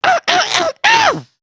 Angry, emotes

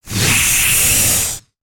Pets Tortoise Angry; creatures; Lego

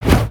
Swing; Mole Whacker

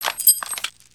idle rattle

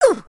Male Get-Hit; dialog

